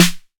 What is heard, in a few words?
sizzle steel snare drum sound a sharp key